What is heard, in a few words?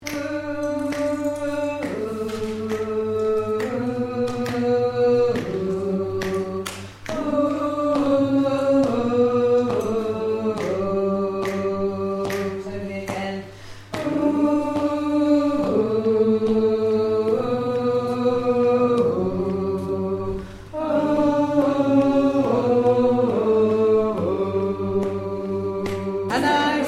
Bass